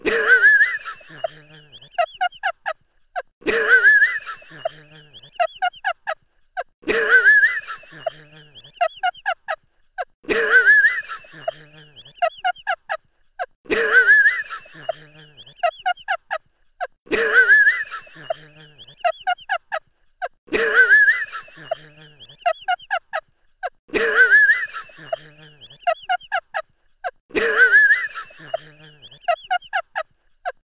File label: looped laughter